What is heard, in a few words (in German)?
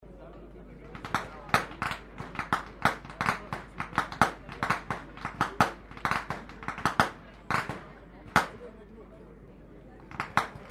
schnalzen, jugend; waging